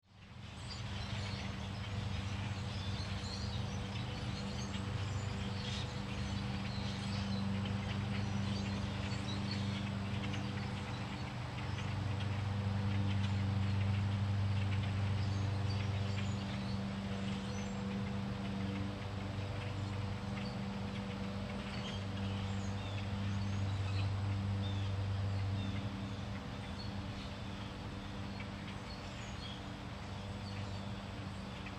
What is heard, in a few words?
Ambience Suburban Day Lawn Mower Birds Neighborhood